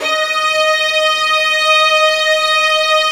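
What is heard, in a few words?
violin section, Arco Violins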